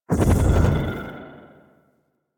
Void Enter; Powers; Warframe; Focus; Operators; Wraith

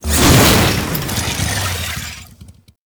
Infested Hammer Whoosh, Weapons

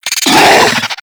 Infested Charger Alert, Enemies; Warframe

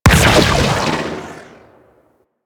Opticor Shot; Warframe; Venus; Venus Sniper Spaceman Agent

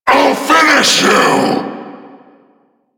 Vocal Attack; Warframe; Duviri; Dax; Vocal Set A

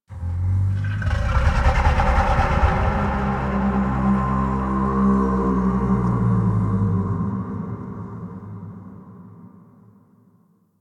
Moan Exterior, Ambience

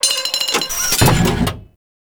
loot locker open; Orokin; Warframe; Tau

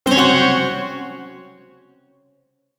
Shawzin Hirajoshi Chord; Warframe; Duviri Shawzin

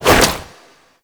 Infested Tendril Whip, melee attack; Zeke; Warframe; Infested Lich

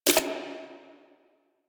Screen Glitch; Warframe; Mini Games; Caliber Chicks Minigame

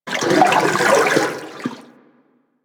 Coolant Tank Step; Warframe; Corpus; Arachnoid Coolant; Steps

